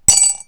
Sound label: damage